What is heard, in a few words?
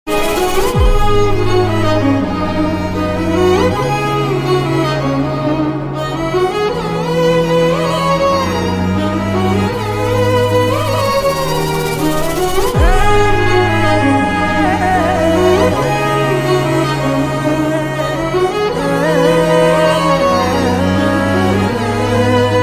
tamil bgm ringtone emotional bgm ringtone; anbarivu